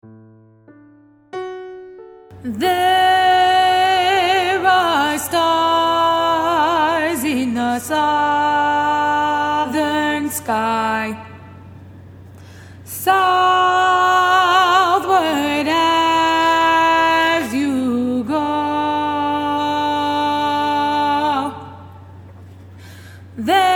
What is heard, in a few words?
Alto; Prince William All-County